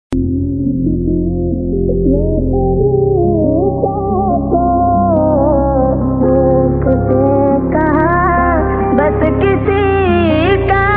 rap ringtone; mobile phone

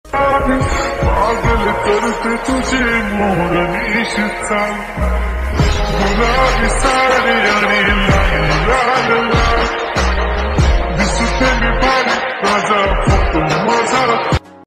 marathi song ringtone

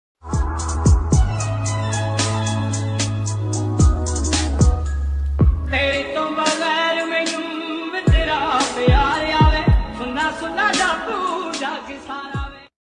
ringtone trending song ringtone

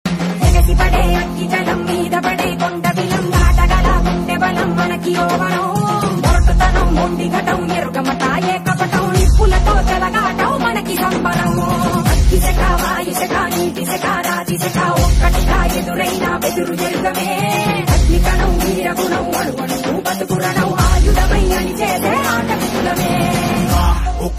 telugu song; kanguva; black screen lyrics

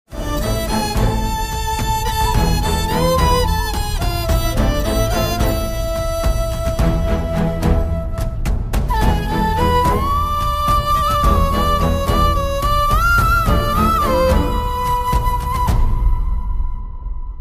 sad bgm ringtone telugu ringtone; sita ramam

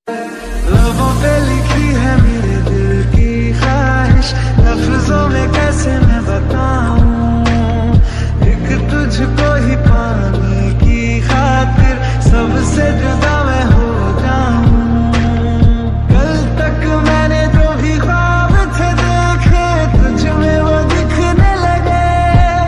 lofi remix ringtone love ringtone sad ringtone

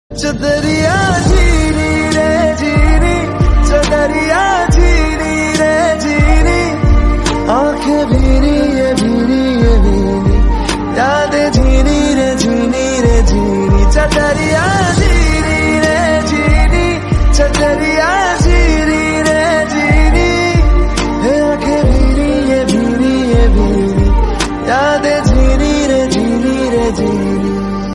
sad ringtone hindi ringtone; badlapur

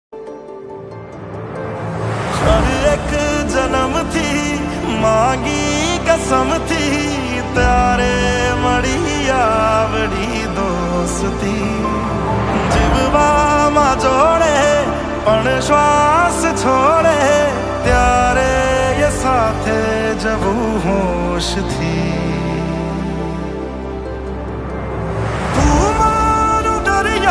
ringtone new song ringtone bhoot wala ringtone

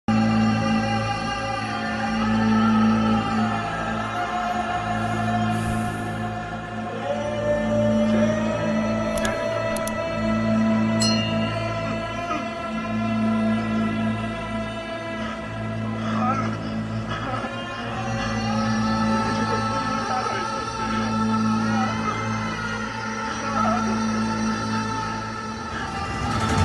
interval sad bgm ringtone

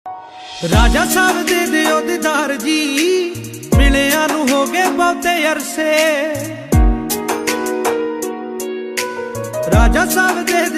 punjabi

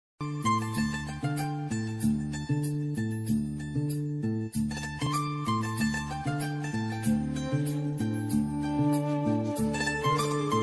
best instrumental ringtone bangla sad ringtone; i love you movie; dev

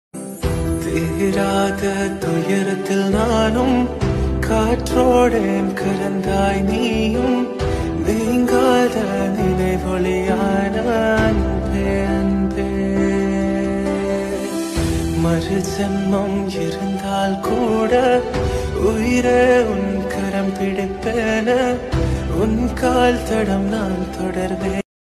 song, tamil love song; black screen editing and lyrics

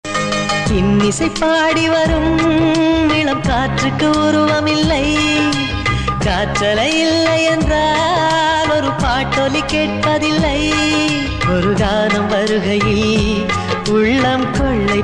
song

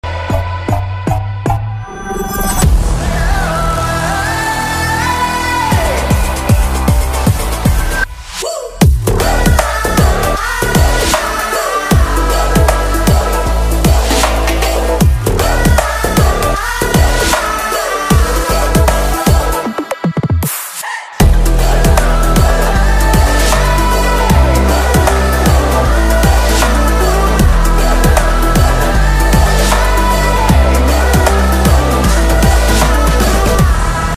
glimpse epic bgm ringtone; the rajasaab

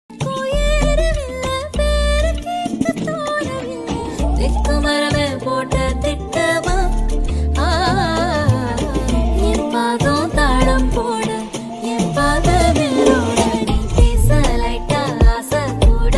tamil song love music romantic; star tamil movie; kavin; preity mukhundhan

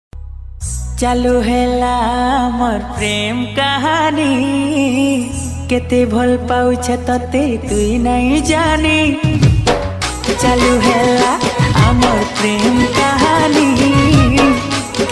sambalpuri music sambalpuri song

